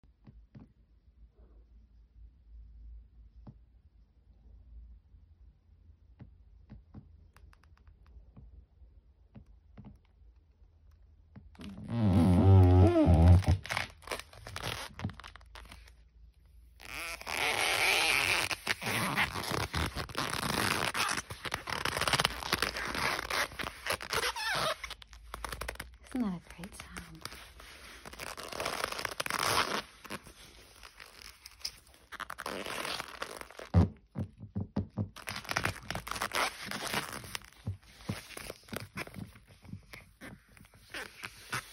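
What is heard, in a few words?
Leather shoes, squeak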